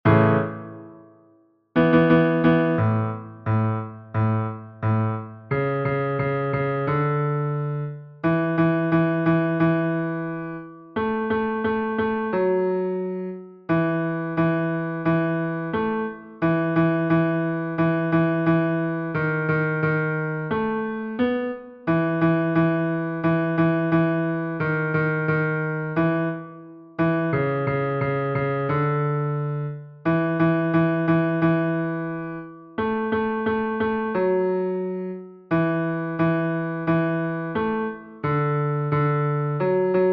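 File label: first bass solo; Lumbye; melodier; Polka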